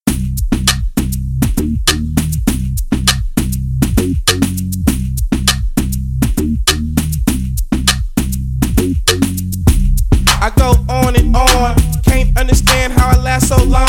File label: rap